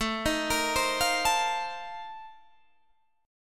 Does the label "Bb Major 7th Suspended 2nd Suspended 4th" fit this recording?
Yes